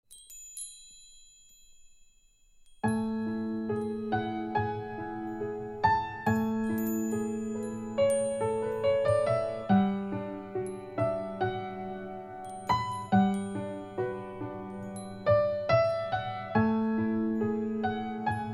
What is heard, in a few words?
Metal; Xiaomi Metal Friday